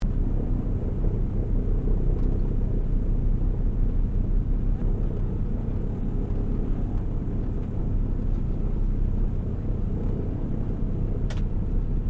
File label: AIRCRAFT DOUGLAS DC3 IDLE INTERIOR; 1942; Qantas 1942 DC3; Airplanes; Vehicles